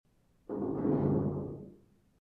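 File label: tsumiki, building blocks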